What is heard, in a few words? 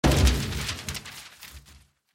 confetti shooting up; Milady X2